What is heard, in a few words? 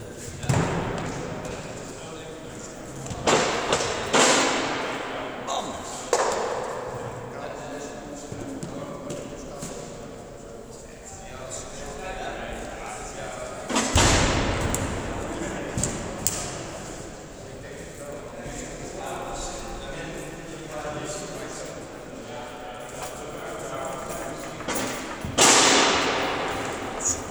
foley